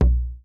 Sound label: Tom Acoustic Warm